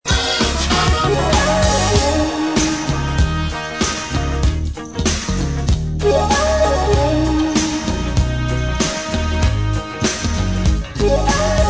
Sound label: emu shakuhachi; syntezatory